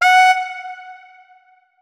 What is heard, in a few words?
notes, saxophone; Hideout Furniture